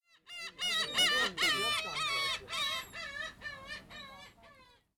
umineko, bird song

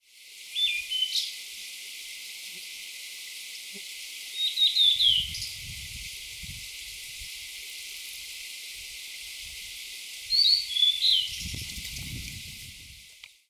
ooruri, bird song; オオルリ; さえずり②; 新潟県佐渡市 ドンデン山; J-eco 鳥のさえずり図鑑

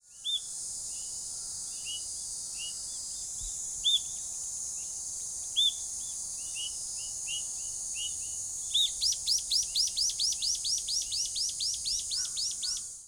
ryuukyuuhiyodori, bird song; リュウキュウヒヨドリ; さえずり; ヒヨドリ科; 沖縄県国頭郡国頭村

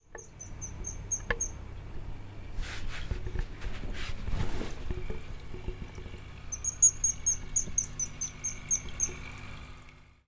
kogera, bird song

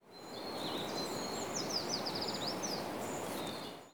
kibasiri, bird song; キバシリ; さえずり②; 新潟県東蒲原郡阿賀町川口 森林; J-eco 鳥のさえずり図鑑

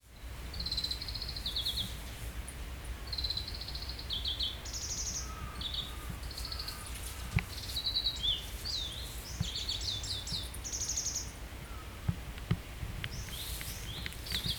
kawarahiwa, bird song; カワラヒワ; さえずり①; アトリ科; 新潟県新潟市中央区 鳥屋野潟公園

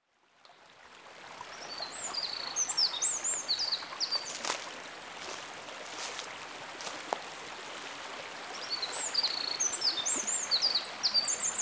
misosazai, bird song; ミソサザイ; さえずり; ミソサザイ科; 長野県長野市戸隠 戸隠自然植物園

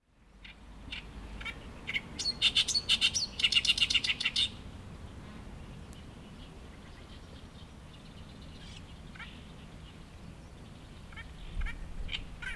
ooyosikiri, bird song; オオヨシキリ; さえずり; ヨシキリ科; 新潟県阿賀野市 瓢湖; J-eco 鳥のさえずり図鑑

